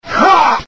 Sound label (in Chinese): 士兵“喝”的喊一声